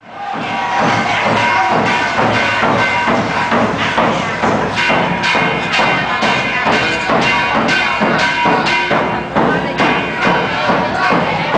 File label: temple; Thean Hou Temple; muntri